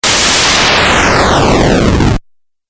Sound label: Fireball Video Game